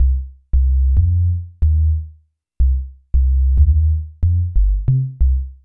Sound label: BASS LP